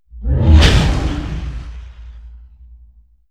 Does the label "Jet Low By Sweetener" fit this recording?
Yes